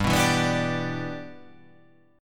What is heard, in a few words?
G 6th